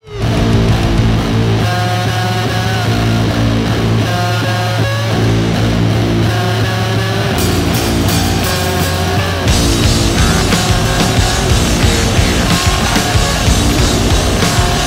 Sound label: Low Tune Riff